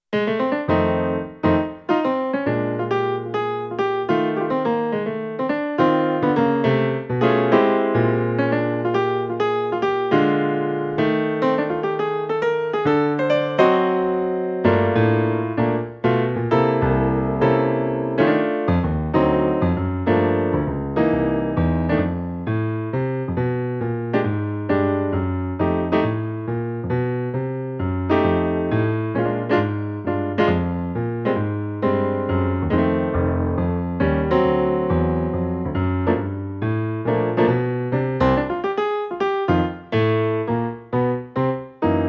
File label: chord